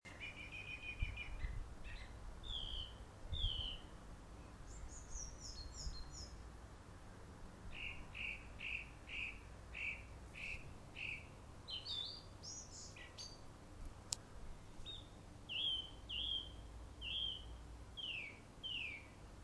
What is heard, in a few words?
dusk bird song